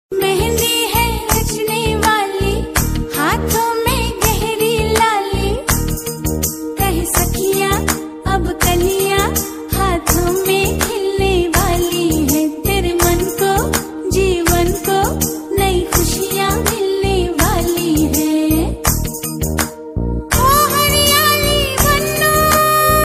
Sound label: wedding song